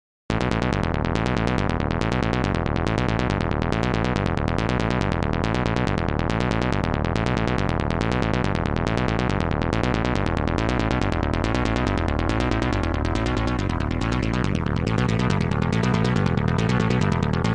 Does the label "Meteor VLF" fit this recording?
No